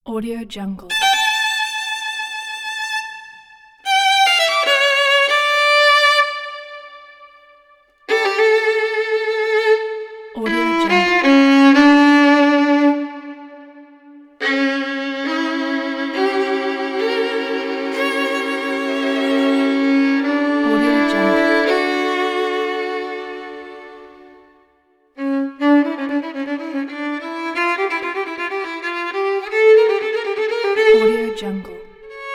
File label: bach toccata